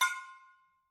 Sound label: Brake Drum Hammer, Struck Idiophones